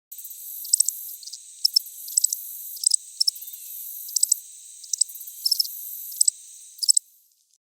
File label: nature crickets; DailySounds